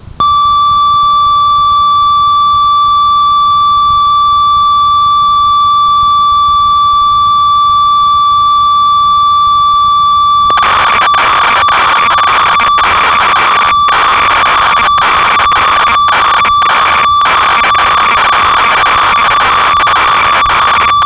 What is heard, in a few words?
ob6, synth